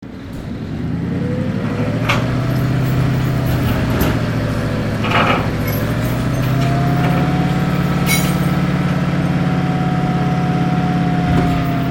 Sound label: Truck crane moving and lifting